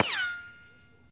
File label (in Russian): odinochnij vistrel iz multika